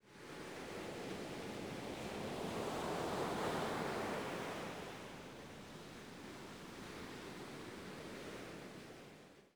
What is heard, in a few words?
Ocean, ambience